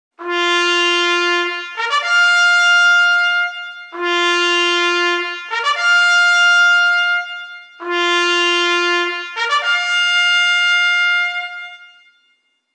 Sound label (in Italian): Squilli